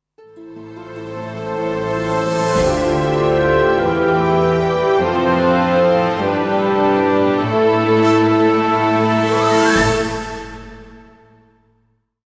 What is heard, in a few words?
jingle; matrix